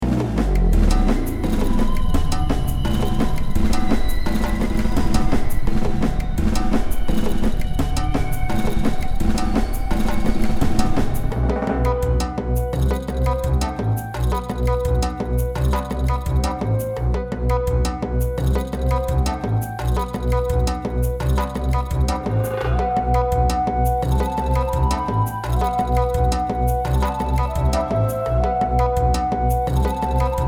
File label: grove battle, RPG; The Frontier - RPG Set